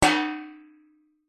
impacts metal tray hanging suspended hit resonate

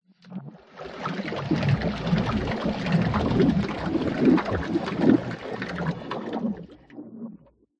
water movement tank pipe glitchy metallic delayed

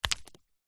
small stone drop forest floor; zapsplat; foley

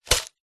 food noodle pot dried plastic set down; zapsplat

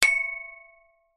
impact metal pole swing playground

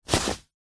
foley clothes sports shorts pick up from carpet; zapsplat

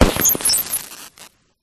Glitch Factory Boom Crackle; Glitch Factory 01; sound spark

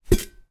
teabags place into teapot; food drink

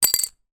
food drink beer bottle cap down on table; zapsplat

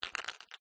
batteries aa handle rhythmic click; skyclad sound

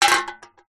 can soda empty set down wood floor

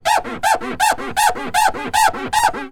foley wood polished rub squeak multiple; zapsplat

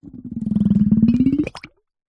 cartoon slime bubble expand pop; zapsplat

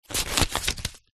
household book very small page turn; zapsplat

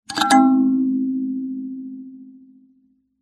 musical karimba tine strum

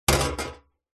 industrial tool metal med heavy set down on metal; zapsplat

